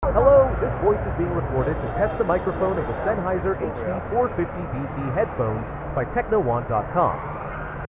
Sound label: in street; Sennheiser hd 450bt; sennheiser hd 450bt review